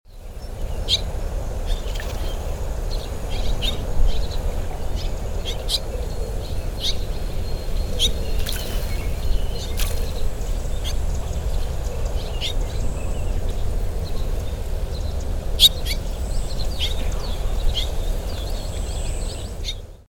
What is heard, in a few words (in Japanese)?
ryukyutubame call